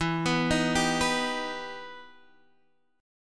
E Minor Major 7th E

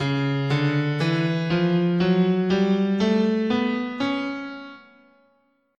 C sharp Eight tone Spanish, scale

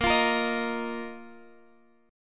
A sharp Suspended 4th

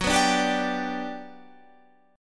G Major F sharp, chord

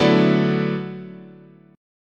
D 6th Add 9th, chord, guitar

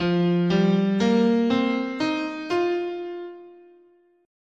F Suspended Pentatonic, scale

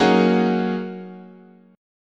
F sharp 6th Add 9th, chord, guitar

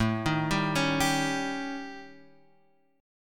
A 7th Flat 5th

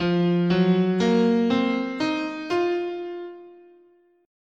F Traditional Japanese "in sen", scale

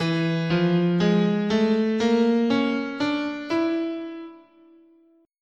E Oriental, guitar, scale

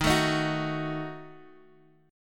D sharp Diminished D sharp, Guitar

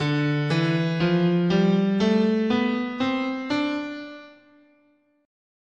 D Dorian, scale

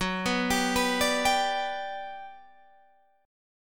G Major F sharp, chord

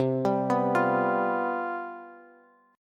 C Major 7th Suspended 4th, down, Bass

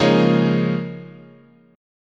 C sharp 6th Add 9th, chord

